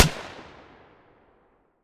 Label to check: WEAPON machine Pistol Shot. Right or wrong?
Right